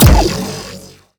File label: Sciweap Shot Beam Small Thermal Defibrillator